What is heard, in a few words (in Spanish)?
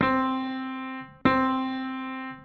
unisono; intervalos; teoria musical; melodia